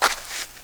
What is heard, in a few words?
SAND, FOOTSTEPS; 1000fx